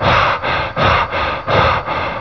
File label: PANT MALE